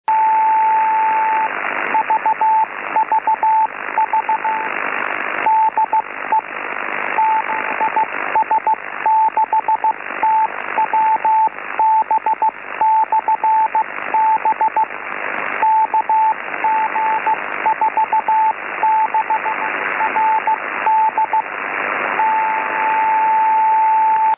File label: beacon, 6m; zs6wb